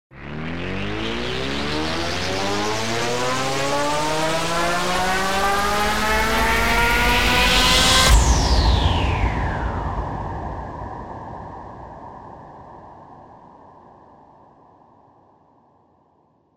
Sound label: raucous rise impact